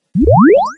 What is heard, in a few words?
raygun zap